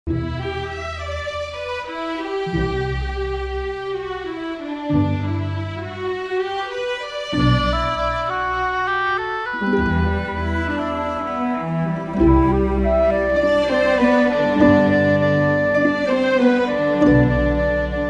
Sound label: classical